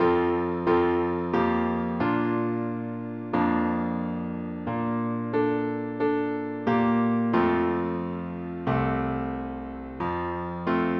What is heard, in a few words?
piano slow; slow tempo; PSE Music; PlayScore version